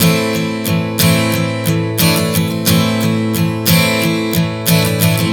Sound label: Strum 090 Am, One Chord Strumming 090 bpm